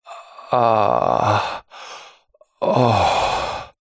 human male exhale ahh pleasure groan; zapsplat

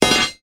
impact metal bar hit place down heavy; zapsplat

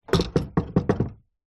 household table lamp metal antique down on table

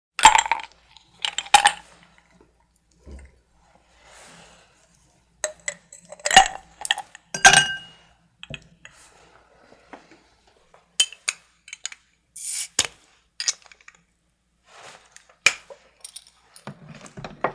food drink putting ice in glasses pouring drinks; sound effects one

